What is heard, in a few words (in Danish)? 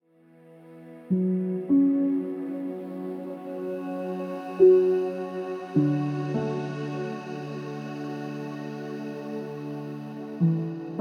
Beroligende musik til din hund